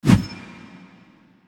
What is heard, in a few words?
small fire; smite; guanyu